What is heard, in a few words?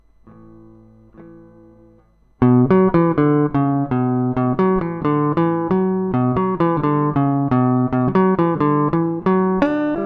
Noodling